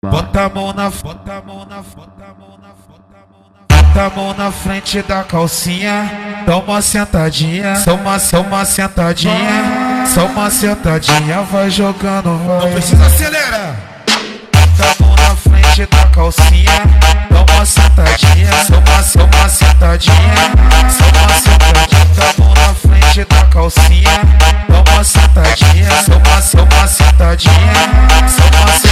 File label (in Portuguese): especial funk mega bass